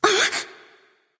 surprised; smite; voicepack; medusa

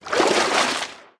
death splash; smite; poseidon

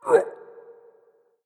hitreact human male small

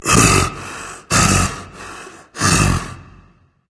breath berserker; cuchulainn

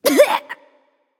clearthroat; charybdis

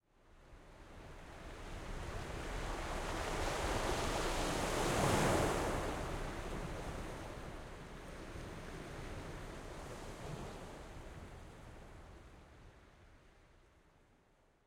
defeat ocean; smite; pele

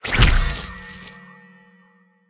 gungnir charge; smite; odin

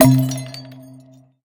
Death Head; smite; god cupid skin13

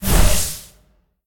fire; Smite; Tiamat

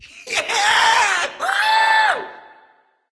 nv yay; smite; voicepack; xbalanque

